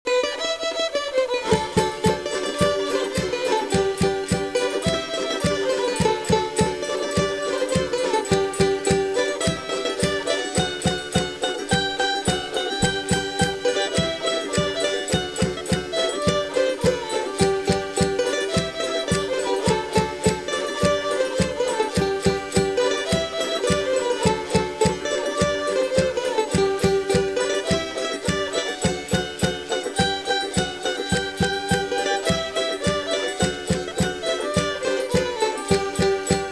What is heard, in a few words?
instrumental